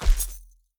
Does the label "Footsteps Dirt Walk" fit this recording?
Yes